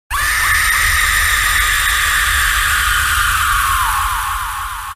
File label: pencil